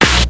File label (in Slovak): rytmicak